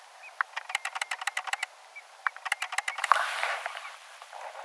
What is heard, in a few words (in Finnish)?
nuoren valkoviklon aantelya lahiaania